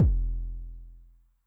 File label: BD MOD Pitch Low, bass drum, 909; classic machines; 909mod